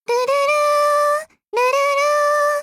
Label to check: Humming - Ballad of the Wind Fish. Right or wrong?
Right